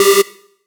Synths G; vengeance essential club sounds-3

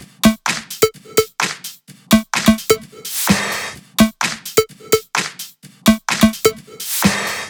128BPM Dogde Kit

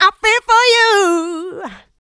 voc, female vox, Vocal House

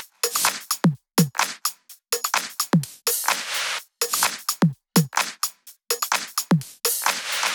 Kit 4, 127BPM; Infected Kit; Vengeance Samples

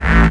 Offbeat Bass A; Vengeance Samples

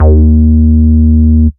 Root D#, Bass

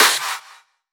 Claps; Vengeance Samples

